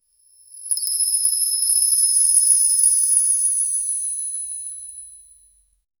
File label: a gentle shimmering sound, bells